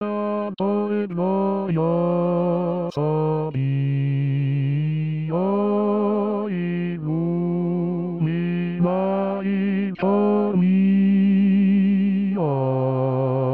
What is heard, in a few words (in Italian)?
Bassi